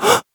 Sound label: Voices Oh